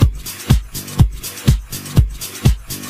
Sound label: daft percussive, drums; DrumExtract; YellowNoiseAudio